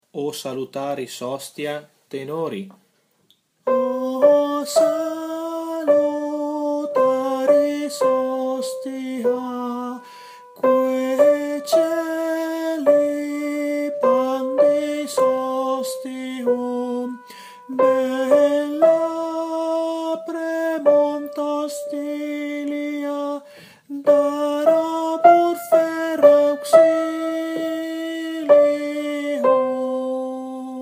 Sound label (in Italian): Tenori; O Salutaris hostia